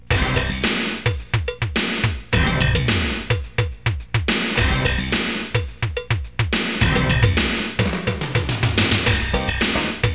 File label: Street Beat